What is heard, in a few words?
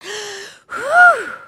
panic, emotes